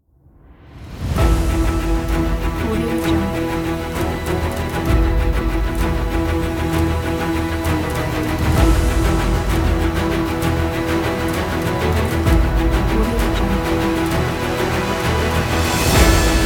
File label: epic military